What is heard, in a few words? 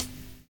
JABO KIT; Univers Sons